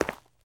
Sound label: zombie footstep concrete; DeadFright